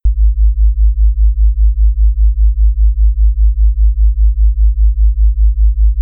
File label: beat 50 vs 55 hz, Acoustic Beats; acoustic beats mismatched musical frequencies